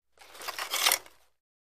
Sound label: Roller Blading; Roller Blades Being Picked Up.